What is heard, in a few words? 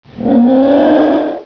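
elephant roar; ANIMALS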